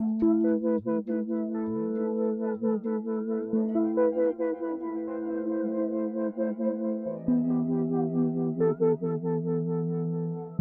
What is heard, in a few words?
Amin lost memory chords and beat; daily samples